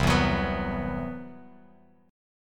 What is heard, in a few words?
C Major Sharp 11th, Bass Standard; CM#11 strummed; JGuitar